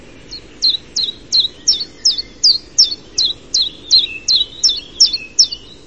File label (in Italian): Lui Piccolo Phylloscopus collybita